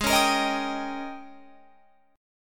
G sharp Major 7th Suspended 2nd Suspended 4th, Guitar, Open F; strummed; 20,20,22,22,22,22; G#M7sus2sus4